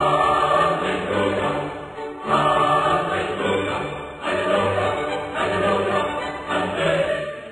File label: halleluah halleluah